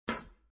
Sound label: metal